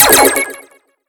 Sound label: wild Transform; netent